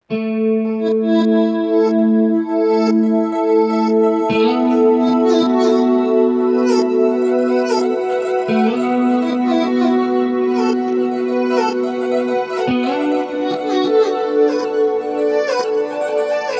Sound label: Chill Dreamy Beautiful Emotive; CUES for film TV games etc